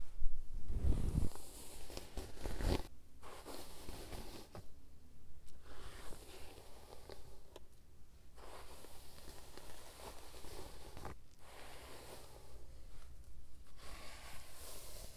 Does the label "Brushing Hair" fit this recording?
Yes